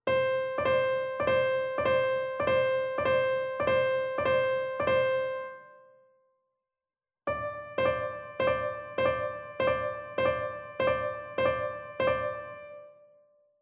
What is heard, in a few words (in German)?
Verzierungen Triller Punktiert, Paradiddle